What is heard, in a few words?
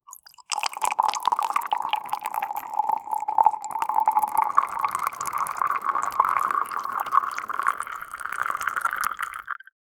liquid